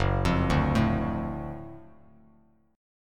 Gb Minor Major 7th Sharp 5th Gb down Bass Standard; GbmM7#5 arpeggiated; JGuitar